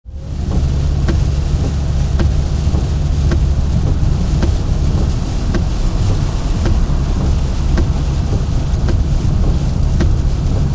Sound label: Recording of interior of car while travelling in rain with windscreen wipers; Driving In Rain; Cars; Vehicles